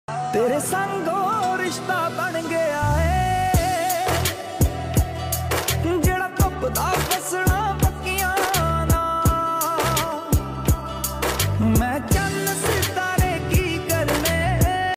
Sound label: panjabi ringtone